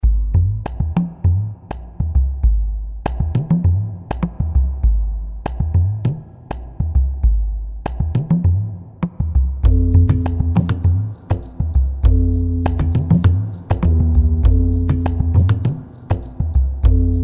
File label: tabla for two